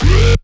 Bass Growl

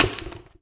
glass break

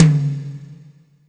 Tom; osu

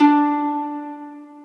harp

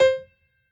piano hi

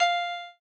Koto F6

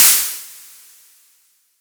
fizzy cymbal

